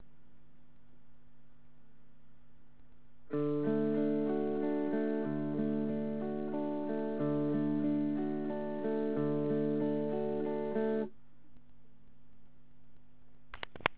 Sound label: arpeggio